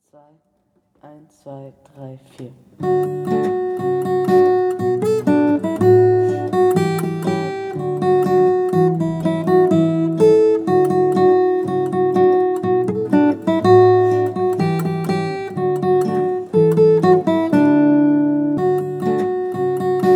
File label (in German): Jingle Bells Gitarrenverison; Belgeitung Herr Metzler